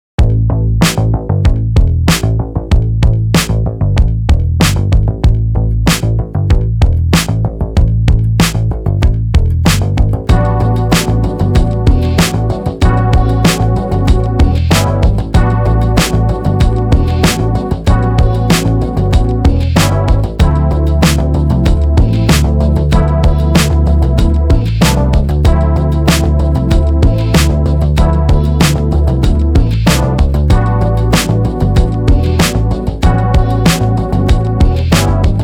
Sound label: Blues